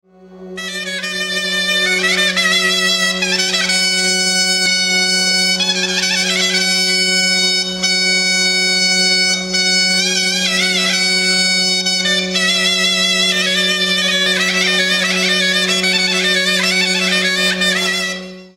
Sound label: zurna